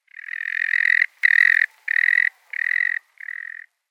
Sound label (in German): Sanderling Calidris alba, Vogelstimmen